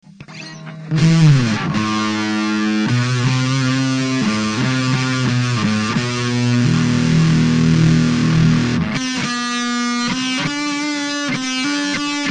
SMOOTH FUZZ

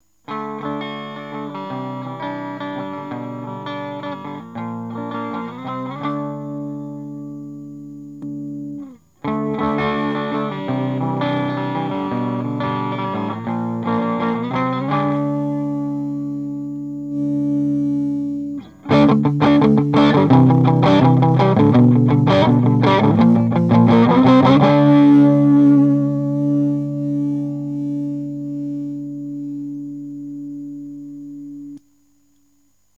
Tran boost; BRIANMAY

